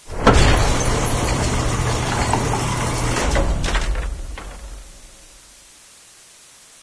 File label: garage; cs2d; cityrp; MCity